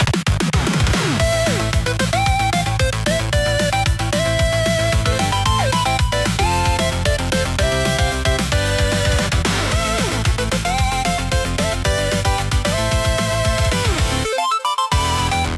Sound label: retro game chip tune; pico pico sound